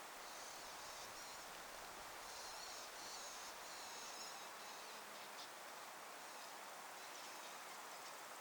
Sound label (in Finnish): pikkulepinkaisen huomioaantelya